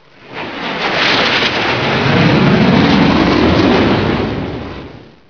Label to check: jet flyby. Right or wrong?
Right